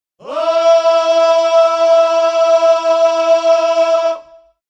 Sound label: african vocals male oh, sung; VOCALS and SPOKEN WORD